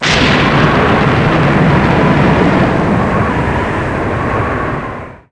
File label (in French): orage; Mobiclic; art